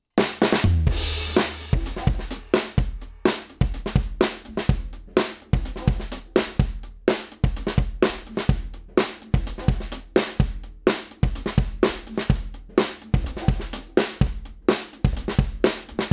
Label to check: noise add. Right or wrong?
Wrong